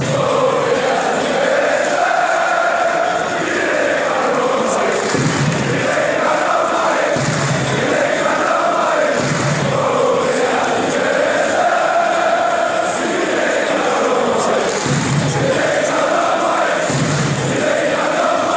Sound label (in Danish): vi ligger nr 1, fanchants